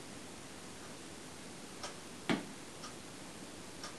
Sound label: knock